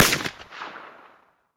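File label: pistol sounds; zvuki pistoleta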